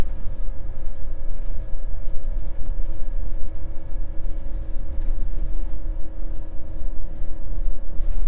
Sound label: electric bus vehicle after